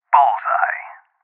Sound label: Sniper Shot